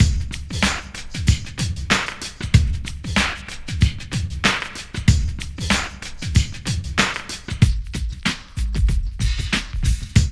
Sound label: PHAT LOOP; E-Lab; X Static Goldmine 2; akai